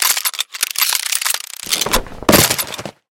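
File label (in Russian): быстрый сбор необходимого орудия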